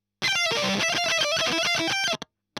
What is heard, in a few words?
EL Fuzz End